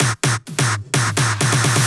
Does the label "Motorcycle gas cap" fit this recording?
No